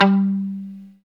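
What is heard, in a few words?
guitar mute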